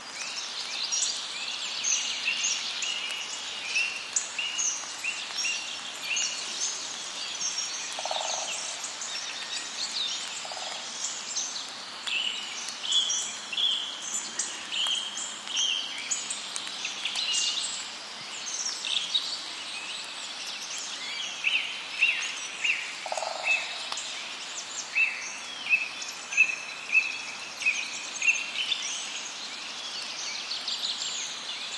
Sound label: bird